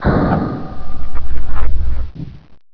alien door

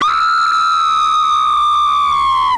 scream

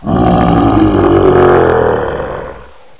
roar

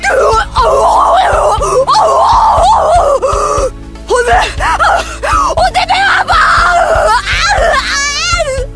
uuoohh

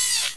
retract, portal turret

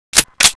draw; weapons; sti

